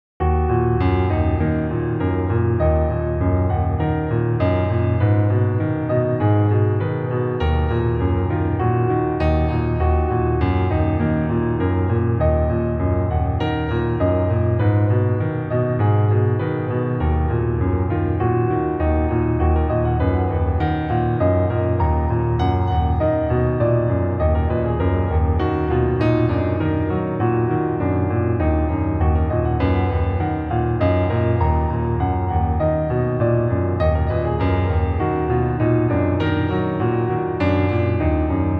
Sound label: prelude eb minor